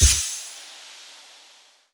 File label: Sword Shoot